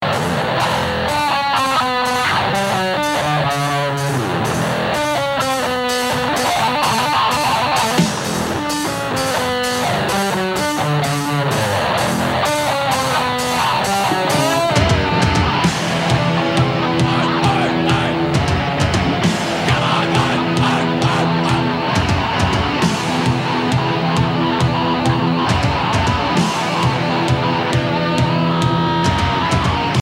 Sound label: Bass Solo